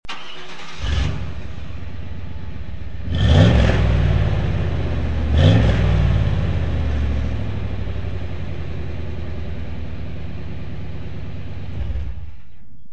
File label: after exhaust